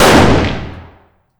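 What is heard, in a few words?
fire dist 556x45 rifle int; gmod; arccw uc commons attachments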